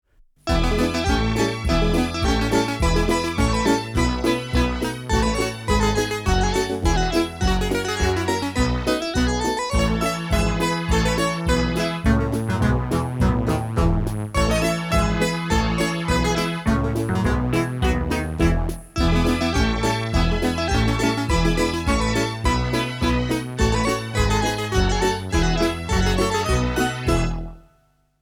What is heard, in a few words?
cheerful